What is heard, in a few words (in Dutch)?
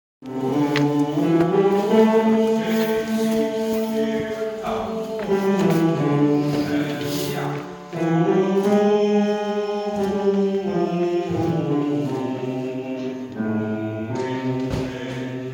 Bas; The Sally Garden